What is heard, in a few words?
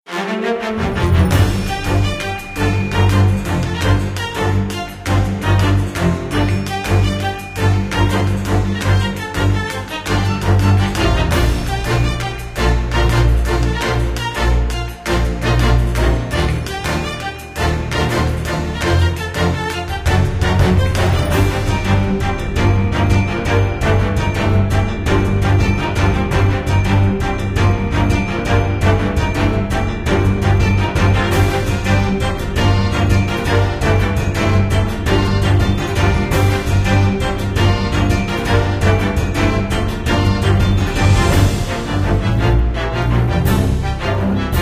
Joint Reasoning